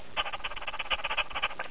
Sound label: pant